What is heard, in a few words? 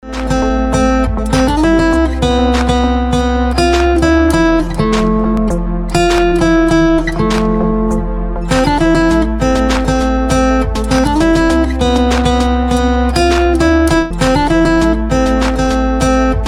Guitar Ringtone